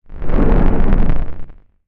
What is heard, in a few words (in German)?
schaden; Barotraumatic; Hornshrimp